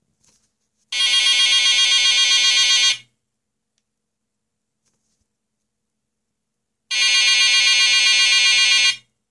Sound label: Mobile hEar medium; Opis-60